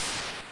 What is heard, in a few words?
Minimize; Liberator